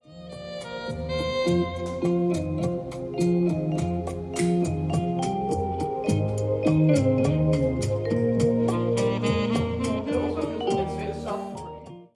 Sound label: slack smooth jazz sounds